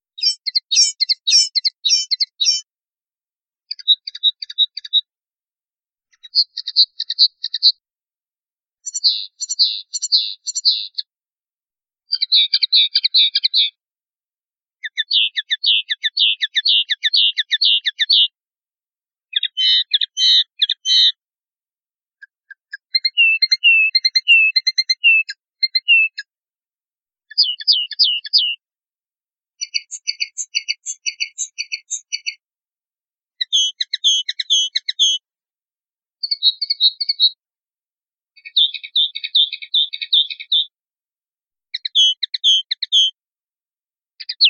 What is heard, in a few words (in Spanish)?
tatachin, pardillo